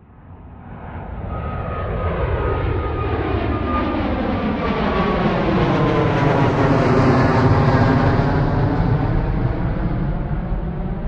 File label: aeroplane; grade1; transportation